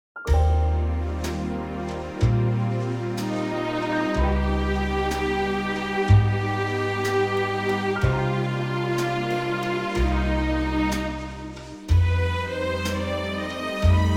orchestral; key - Eb

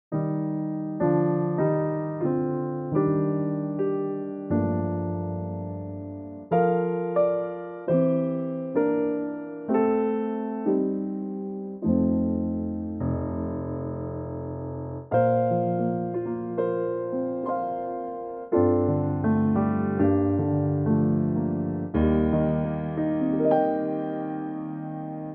Bb Piano; key - Bb - vocal range - G to G; Gorgeous piano only arrangement of this sublime carol, no tempo in mind

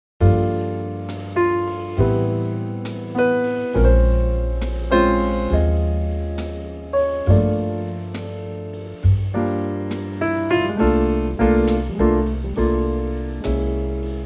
A trio F

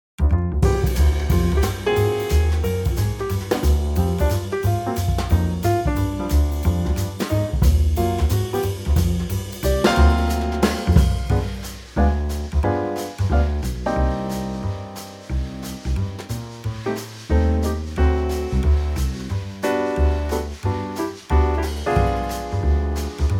G Trio

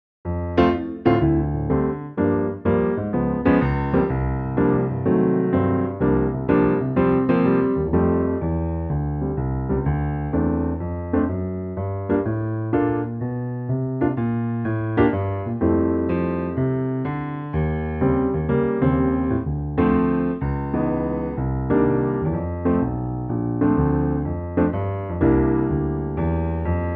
Piano F; key - F - vocal range - E to G; Intimate piano only arrangement of this quirky jazz favourite

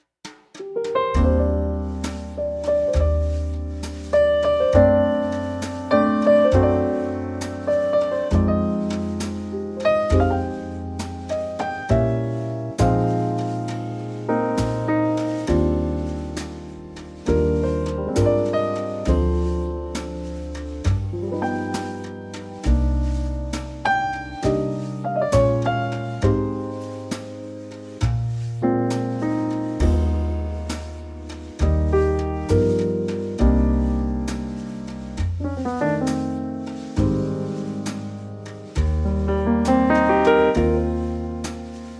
Eb Trio

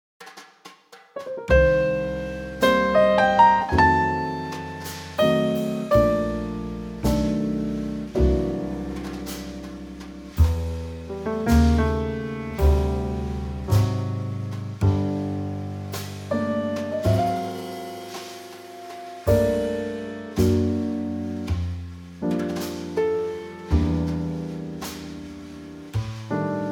Gm Trio

